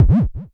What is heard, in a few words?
Klone Dual Percussion Synthesiser tom